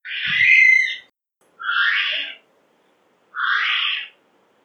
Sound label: Rowi Female close